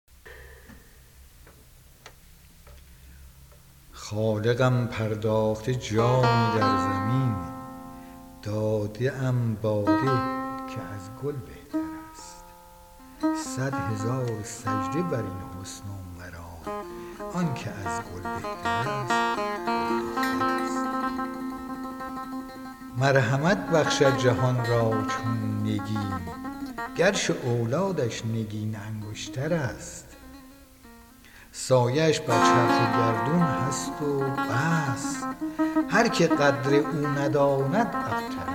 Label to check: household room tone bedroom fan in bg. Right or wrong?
Wrong